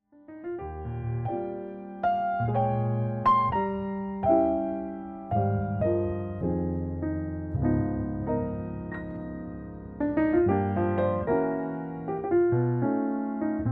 jazz lullaby

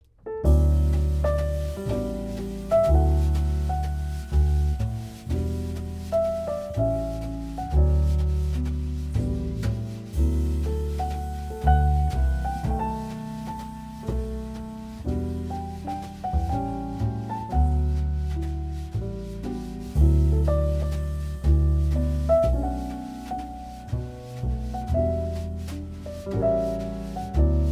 jazz lullaby

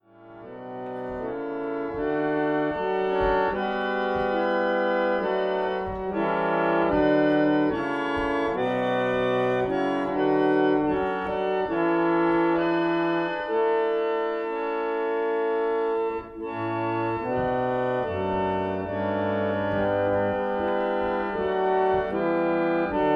reed organ hymns

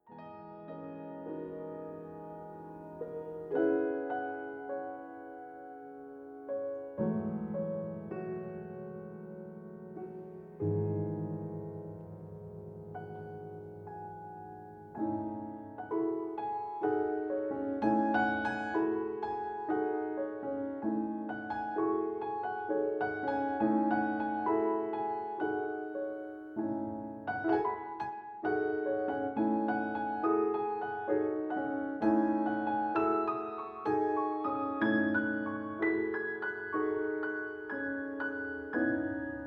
piano sketches

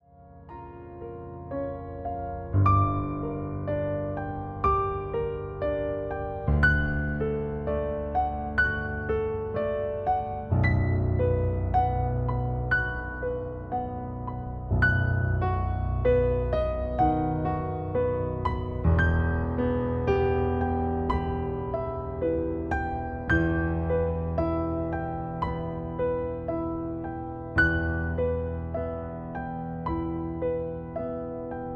piano

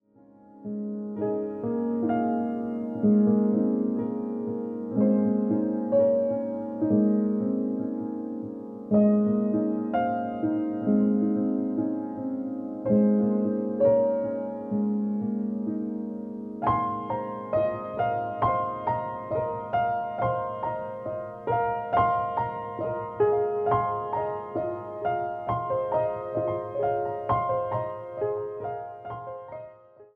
silent rain

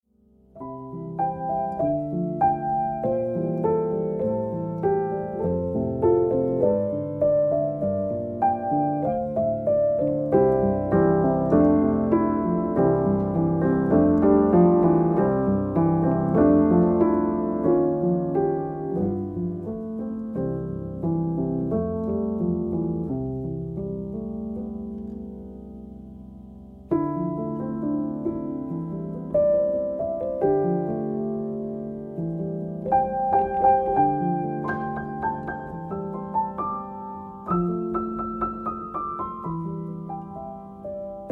piano

